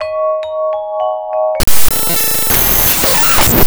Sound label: vibes C#, vocal house; PS-28 Vocal House Samples